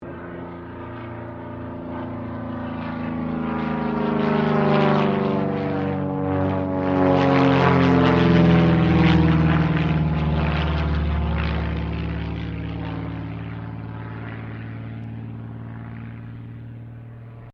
Aircraft CAC CA-25 Winjeel barrel roll, WW2 era Australian training aircraft; Airplanes